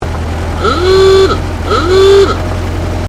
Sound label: Truck Chevrolet 1926 30cwt Horn